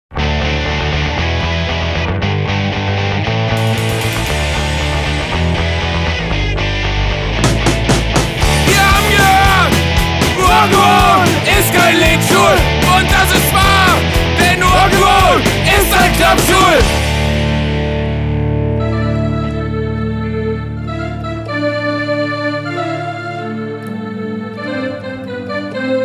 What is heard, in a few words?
Rock n Roll